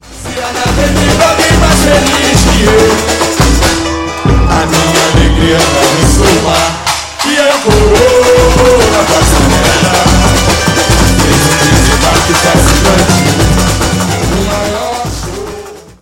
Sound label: Middle break